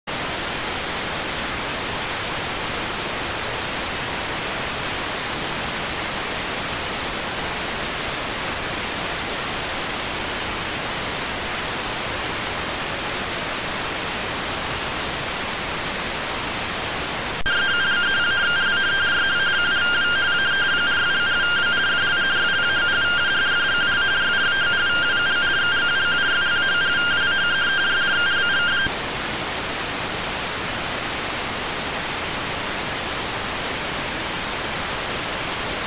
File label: rtty rx